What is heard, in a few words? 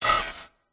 Futuristic Technology and UI Error Tone; UIAlert; Slava Pogorelsky